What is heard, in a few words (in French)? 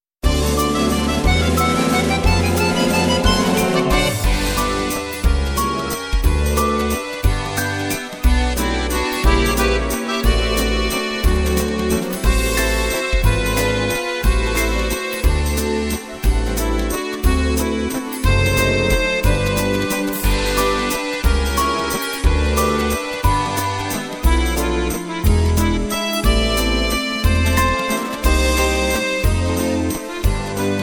valses viennoise melodie; medley 3 valses viennoise